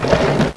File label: Drawer; VideoStakGold